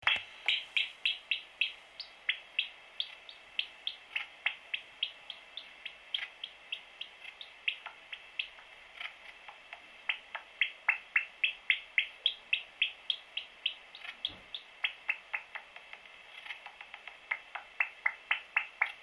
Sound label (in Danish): skimmel